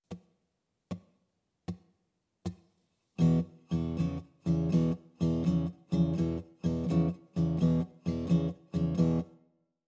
Open Tunings For Blues Guitar

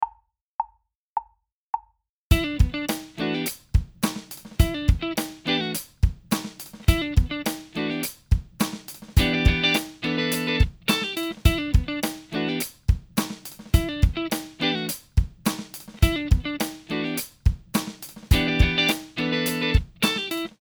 Minor 7ths

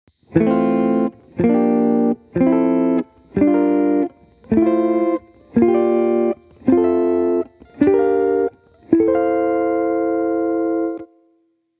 Funk Voice Leading

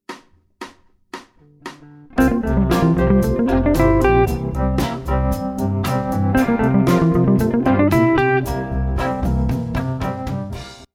Mixolydian Lick

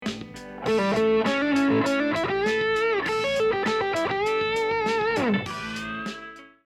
backing track

